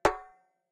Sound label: Step Metal; 30 Days to Survive